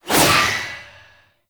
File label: Void Heavy Scythe Alt Whoosh; Warframe; Duviri; Incarnon Adapters; Melee